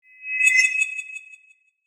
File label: Feedback Metal, Ring; Production Element; Imaging Element; Accent; Transition; Blast Wave